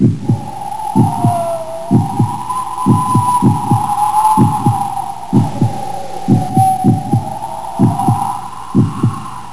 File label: song; hallowseve